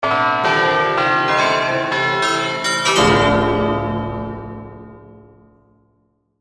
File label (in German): Glockenzucker